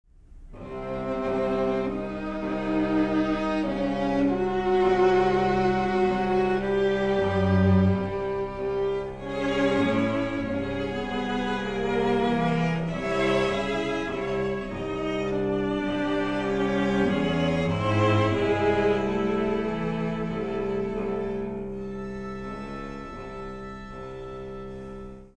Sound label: Quartet Grave